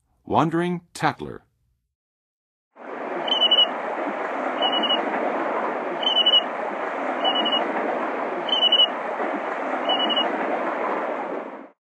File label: Wandering Tattler, Birds of North America; Western USA; Western USA Songbirds